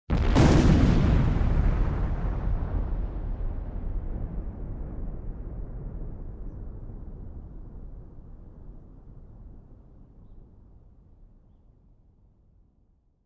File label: Bomb detonation up-close